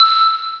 Xylophone E6; Star Trek JR Audio

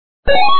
bonus sound; magic heaven

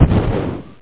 APPEAR

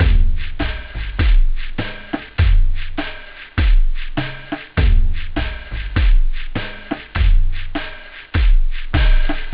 house; OctaMED SoundStudio V1

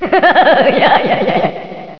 Hu Yuh Ya

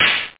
hihat; AlgoMusic; Rhythm

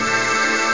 ahhh

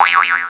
Buz; FRACTIONS PIZZA

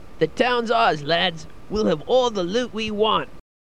Town Victory; Business Shareware

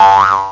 spring; EuroCD 3; AGA; Bounce Ball

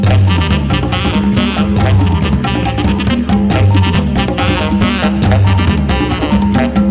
RHYTH, LOOPS

